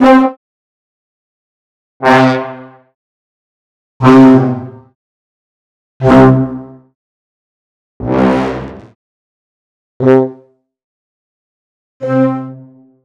Brasses, monosounds

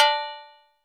BELL1, PERCUSSION; Crypton - DrumBase5000; PERCUSSION#1

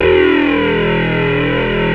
ROCK E1, Classic Guitars; E-MU Formula 4000 Series Vol. 3 – Analog Odyssey

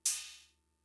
Cymbal; Fricke MFB-522

